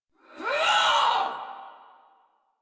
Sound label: Attack Effort; Warframe; Dax; Ranged